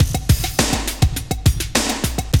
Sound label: loop, Roland; Sound & Vision - Gigapack I